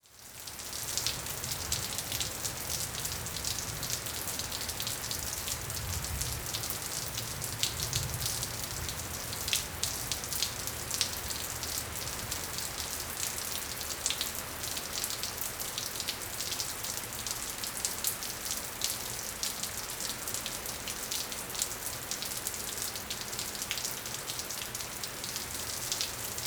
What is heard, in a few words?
Weather Ambience Rain Drips Water; AdobeCC